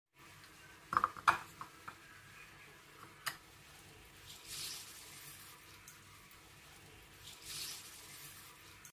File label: Lemon squeeze; چلاندن لیمو